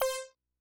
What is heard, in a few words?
Synth